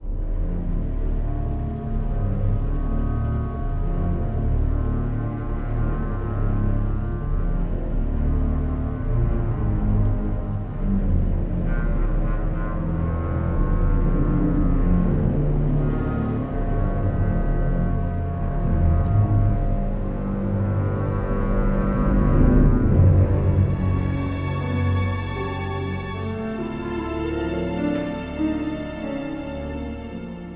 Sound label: suspense, music